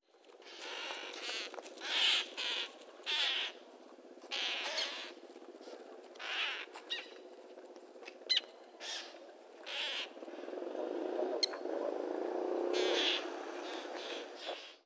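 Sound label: black-naped tern; Miyakojima; koe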